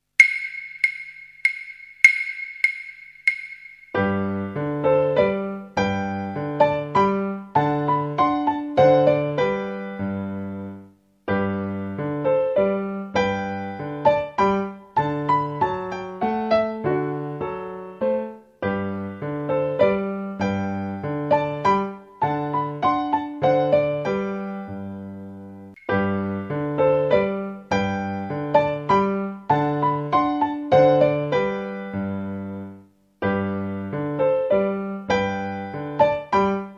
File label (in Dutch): Wals